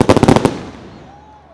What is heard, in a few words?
firework explosion multi